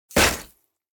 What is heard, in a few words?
Designed cinematic ice impact